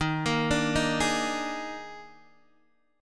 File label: D sharp Major 7th Suspended 4th, D sharp, down, Guitar, Open D